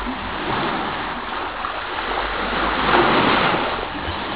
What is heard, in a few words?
wave